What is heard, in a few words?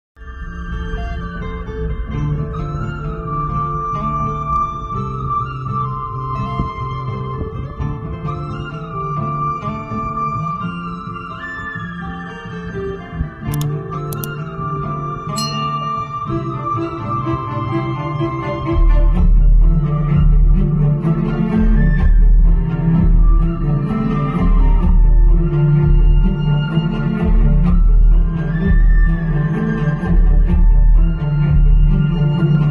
sad bgm ringtone; maa oori polimera2 movie